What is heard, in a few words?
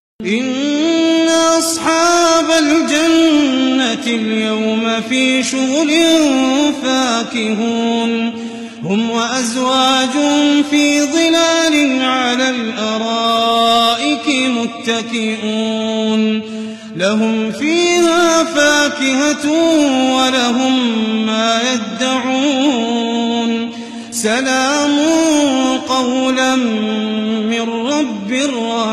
Quran